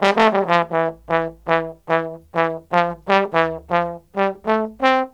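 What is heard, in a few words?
TROMBONE SOLO, BRASS; BRASS KIT; Ragga & Dub Reggae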